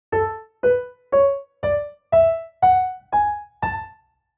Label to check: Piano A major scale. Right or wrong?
Right